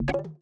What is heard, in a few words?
Connection Error